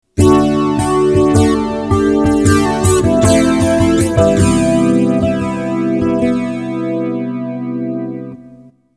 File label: Acoustic 12string; roland vga7